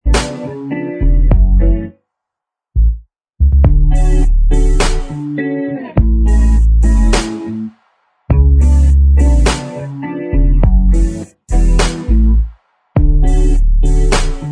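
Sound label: instrumental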